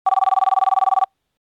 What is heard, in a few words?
request ring